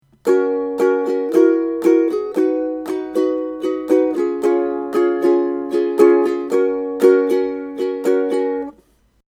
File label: Strum, ukulele